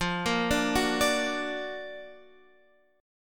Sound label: F sharp Augmented Major 7th, F